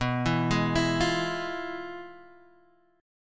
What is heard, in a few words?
F Minor Major 7th Flat 5th, B